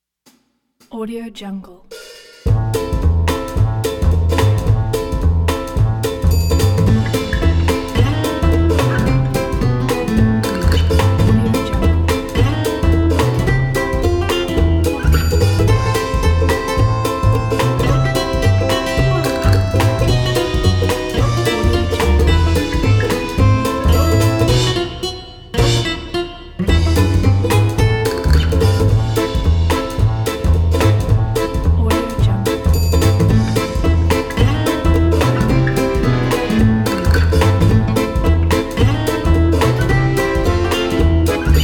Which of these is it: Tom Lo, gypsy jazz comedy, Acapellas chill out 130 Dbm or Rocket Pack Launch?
gypsy jazz comedy